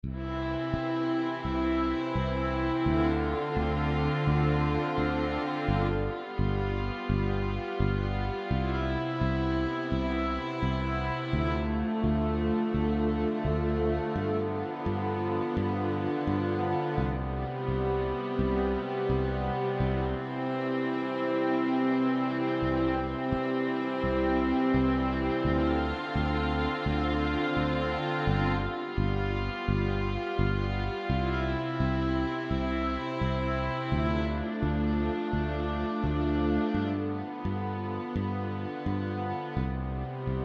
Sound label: LISTENING EXAMPLE ii V I CHORDS; Sample Track – Chords Only